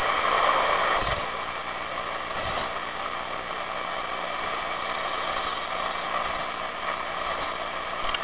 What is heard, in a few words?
otter sound